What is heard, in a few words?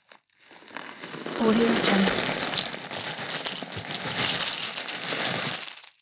cereal cornflakes